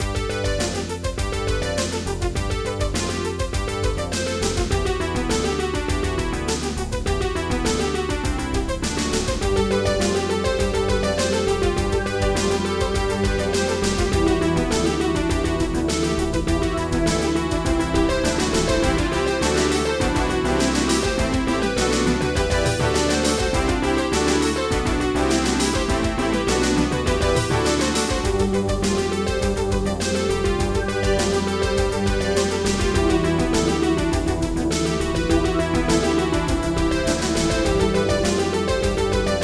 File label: TECHNO